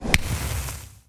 Fire Punch

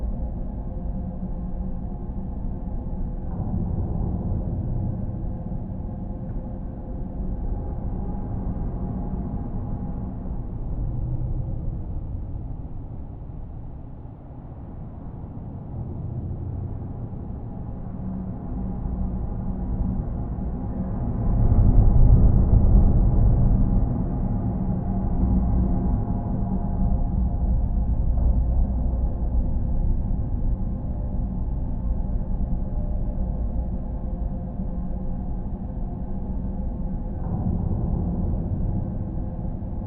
sfx dungeon tonal

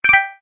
Secret Doc S Collect; Chicken Gun Rooster Rudy; iOS, Android

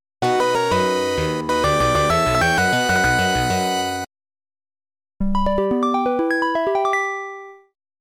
Room Clear ~ Room Start; Namco; arcade

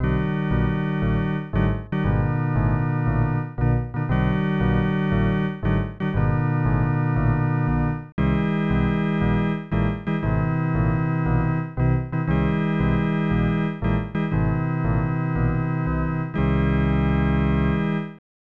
Organ Music

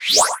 Toss Pulsegrenade

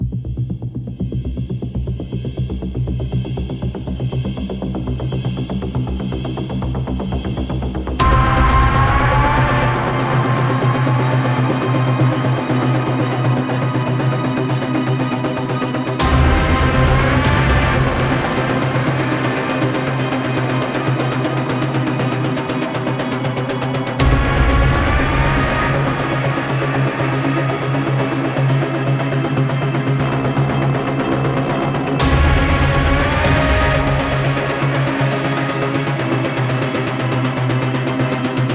Attack Night Suspence, gamerip; dying light

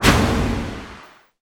dash; Hollow Knight; flamebearer

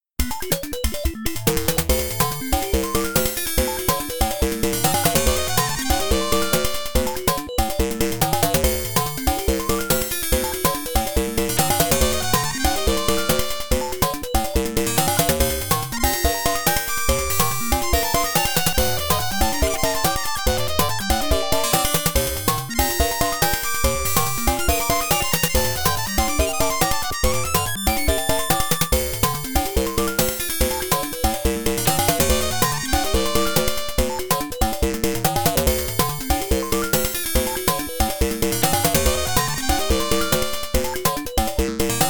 BGM (Fast); Block Block; arcade

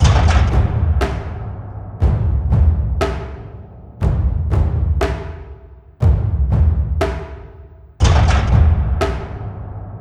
battle layer

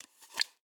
plastic pop bottle pick up

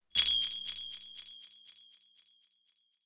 Suzu; Yu-Gi-Oh! Master Duel

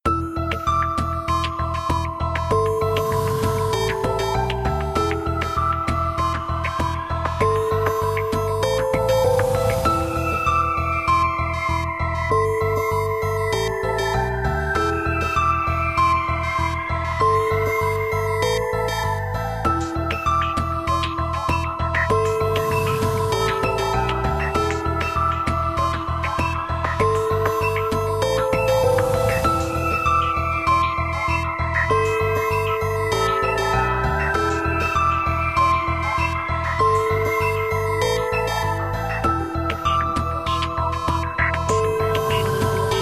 main menu music

